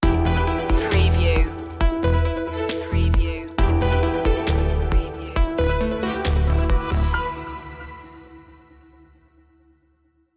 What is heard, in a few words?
digital environment stinger